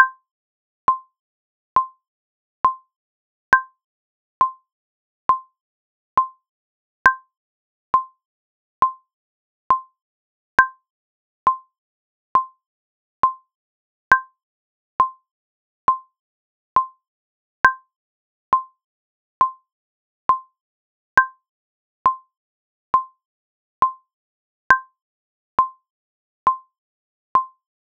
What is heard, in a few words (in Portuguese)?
arrocha 68 metronome